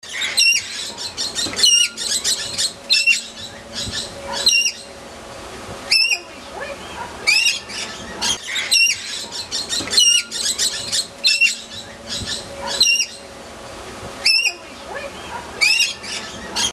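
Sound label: Rainbow Lorikeet, parrot calls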